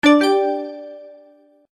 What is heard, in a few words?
msn ring tone; ringtones; sms